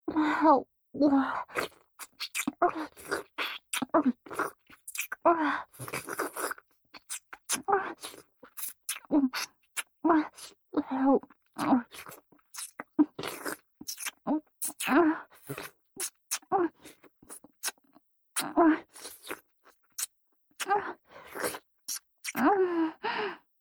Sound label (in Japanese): ディープキス; BGV